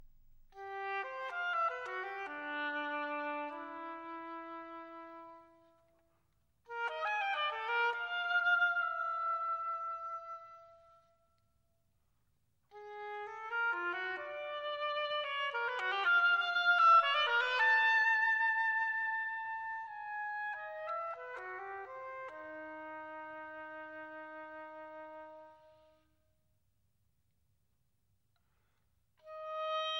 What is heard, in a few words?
Oboe Concerto, Cadenza; Strauss, Richard